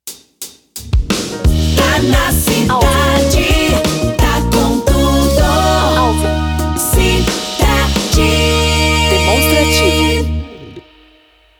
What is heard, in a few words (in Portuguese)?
vinhetas cantadas; ALVIM PRODUTORA